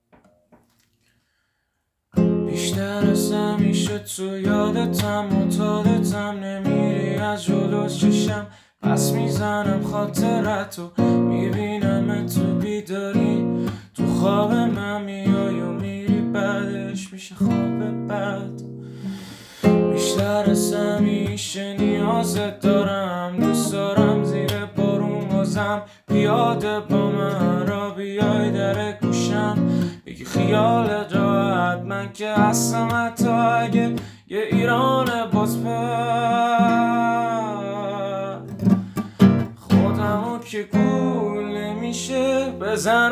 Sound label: Guitar